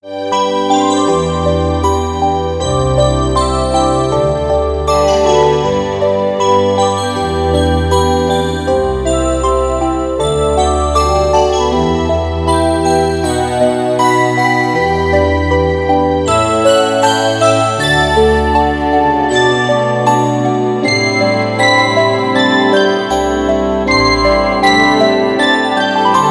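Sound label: melody, winter beauty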